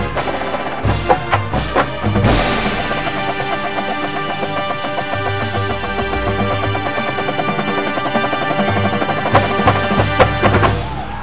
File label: Kick ass accelerando into impact hits at the end.